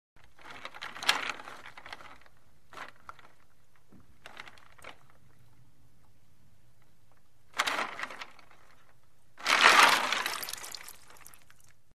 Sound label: Ice Movement In Bucket; Hollywood Edge Foley Sound Library